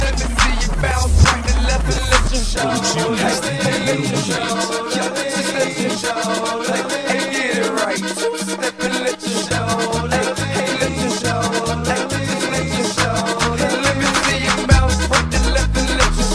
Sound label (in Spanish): cumbia mixx; latino